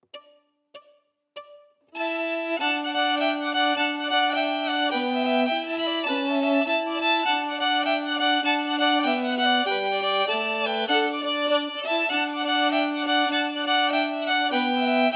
harmonies emphasized

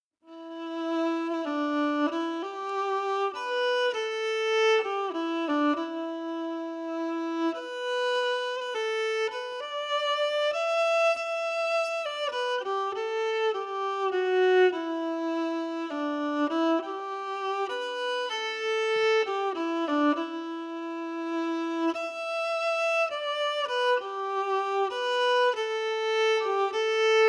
Magic Waltz slow

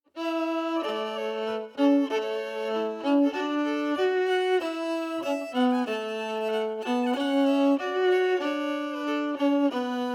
The Headlands harmony emphasized

